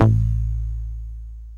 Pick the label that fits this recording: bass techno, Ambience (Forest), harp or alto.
bass techno